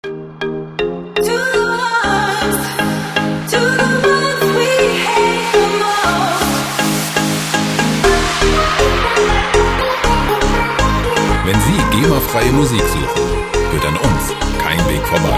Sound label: future pop